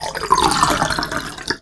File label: element water; maoudamashii; ゆめ2っき